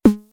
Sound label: snare, DL-909